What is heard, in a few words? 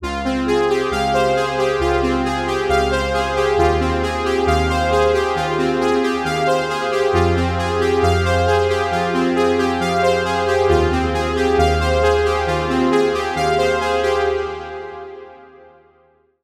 Lead, Spire; Ozone Layer; Routed Sound; Spire Expansion; Reality Maker Vol.1